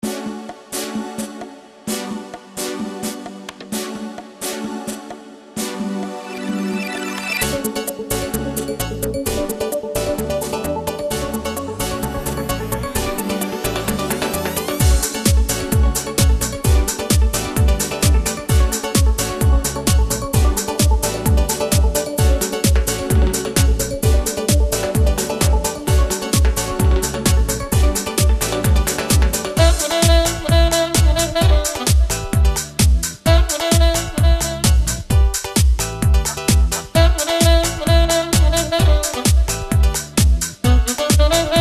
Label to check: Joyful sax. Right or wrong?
Right